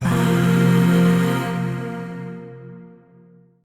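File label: Void Shawzin Pentatonic Minor Chord; Warframe; Zariman; Chords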